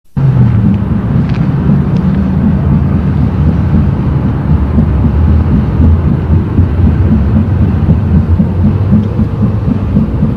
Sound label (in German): Anfahrt; alsdorf